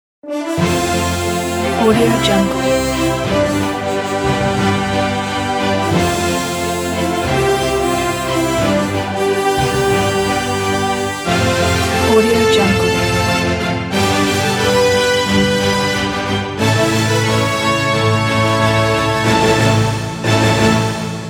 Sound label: grand opening ident; cinemedia